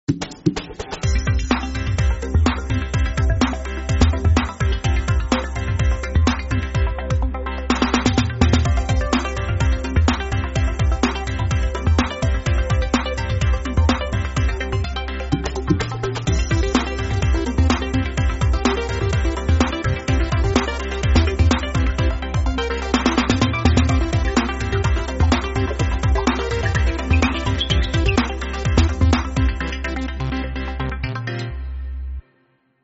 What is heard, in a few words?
Pop